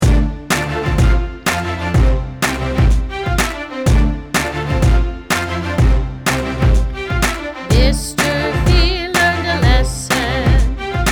vocals

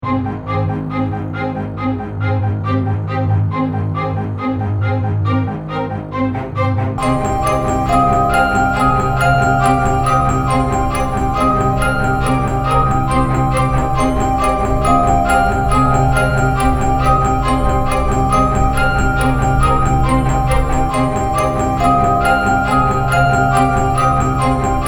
instrumental

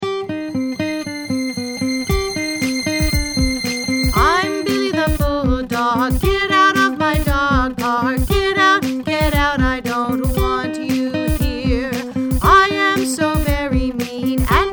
vocals

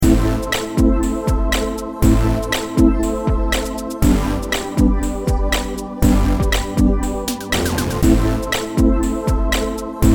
song instrumental

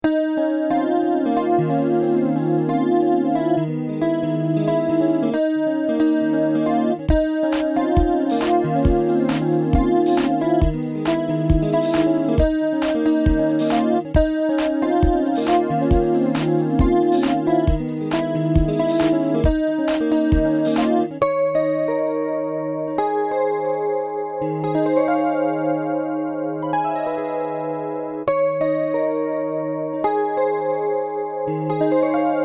Instrumental

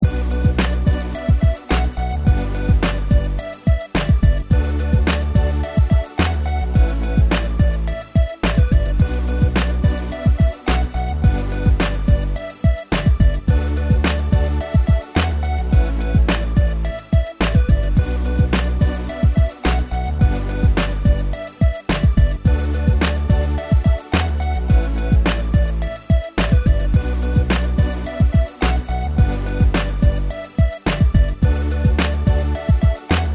instrumental

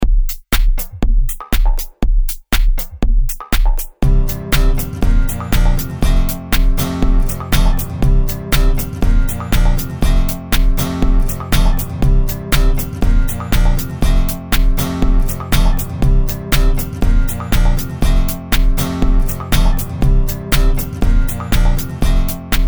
instrumental

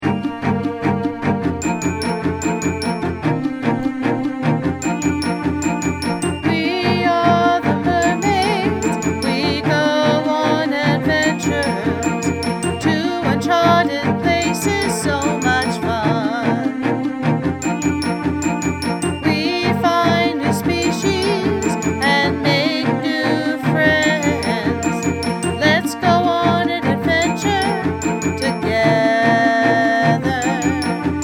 vocals

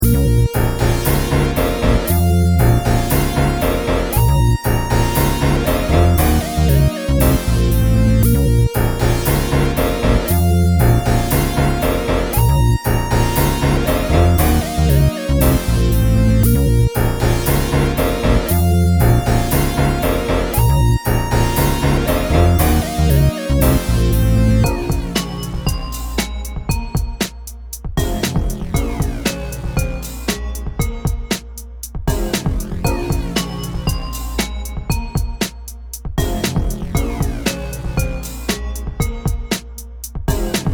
instrumental

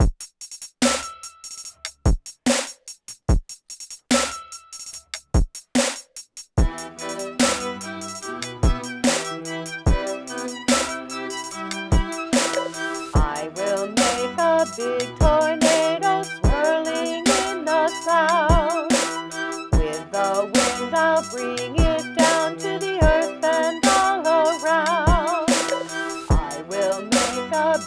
Tornado vocals

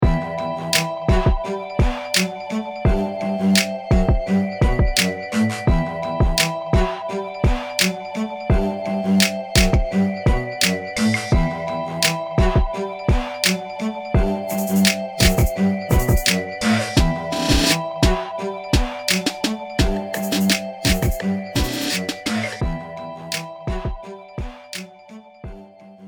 instrumental

